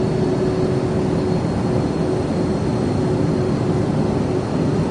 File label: Airplane Cabin